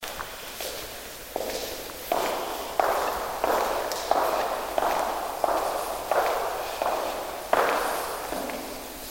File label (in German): geräusch